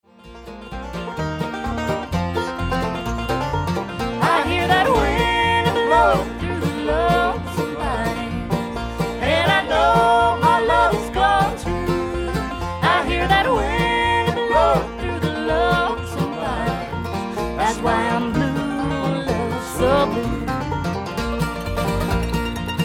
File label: Blues